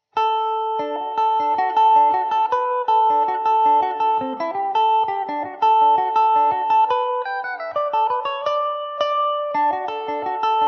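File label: jig D major mandobird, irish mandolin; Andy de Jarlis’ Jig; Epiphone Mandobird VIII electric mandolin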